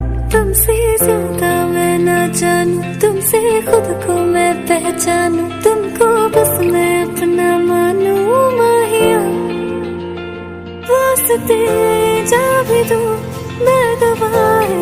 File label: bollywood song ringtone